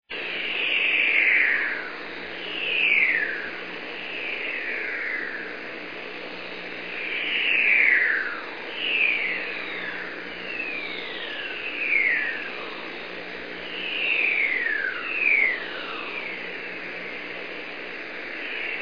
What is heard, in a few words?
Jup s bursts; simfonija svemira